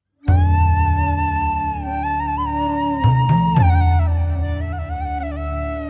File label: Msg Flute; meloboom